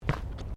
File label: FOOTSTEPS SNEAKERS PLASTIC GROUND MAT STEP; Footstep Foley; Human